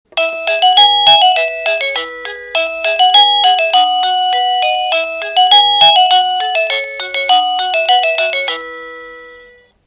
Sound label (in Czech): melodie